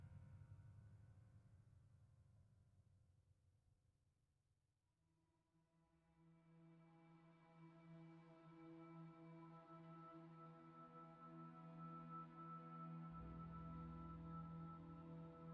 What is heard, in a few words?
Cello; Cut After Credits Cello